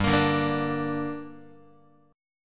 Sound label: G Minor, Guitar; strummed; x,3,1,0,2,1; 4A,4E,5A,5D,5G#,6C#; JGuitar